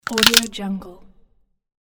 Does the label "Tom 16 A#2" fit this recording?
No